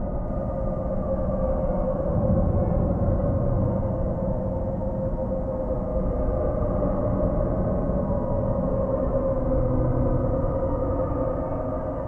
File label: Ghost amb; Ace Lightning; Windows